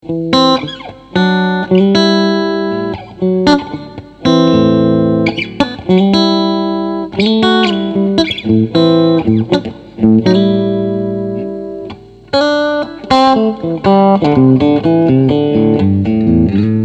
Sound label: TremLord Treble